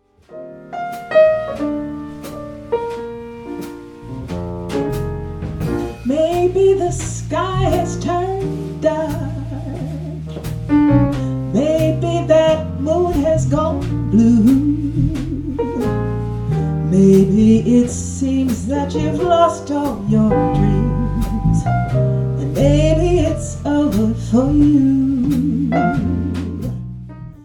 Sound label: Maybe melody